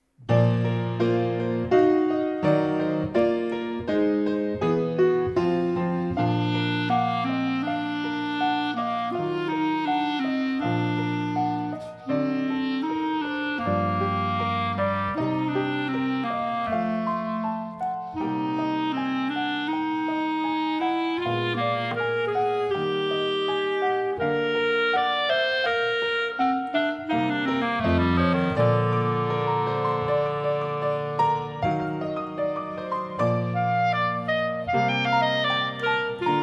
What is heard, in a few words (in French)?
CLARINES